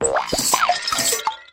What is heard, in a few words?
rollover craft box; activity center; backyard; preschool time